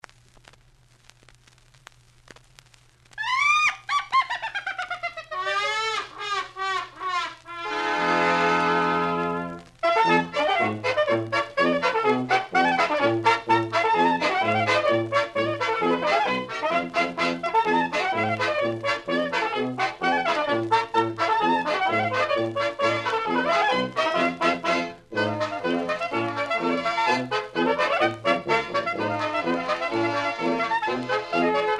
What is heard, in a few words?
Laughing Polka